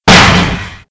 Grill; Playable Tutorial Level

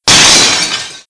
Smashglass; Playable Tutorial Level

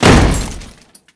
Pushables Collide Metal; Playable Tutorial Level

